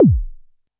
korg trident

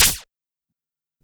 Quasimidi 309, Drums

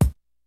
KICK SNARE; Kid Nepro; Elite; KICKS SNARES

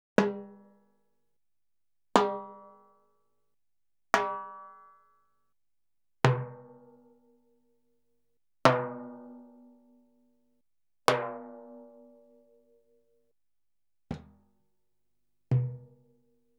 Salsa Picante; Masterbits; World Zone VOL-3

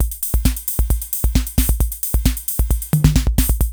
KR55 ROCK, drum; Crypton - DrumBase5000; KR55B

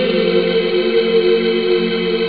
chord; cadaver; Amiga Soundtracker Sample Packs

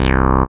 SYN TB303, Roland

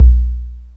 SY35, Yamaha Sy35, Drums

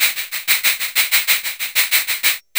LOOP, DRUMS & PERC

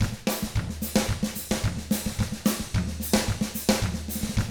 110 SMBA samba; ILIO; Double Platinum Drums 1

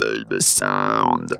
BABY SOUND, Funky Vocals; USB Soundscan vol.18; AKAI

